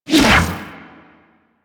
Ball Throw Whoosh; Missions; Tenno Ball

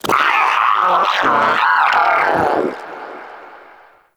Vocal Alerted, Shrimp Lantern, Creatures; Baliq

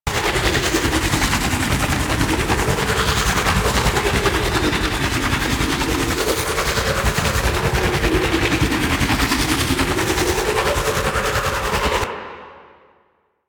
Tornado Loop; Warframe; DE; Sentients; Sentient Trooper

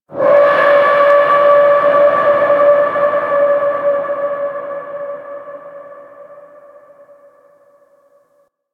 Hub Tonal One Off; Steel Meridian; Warframe; Tenno

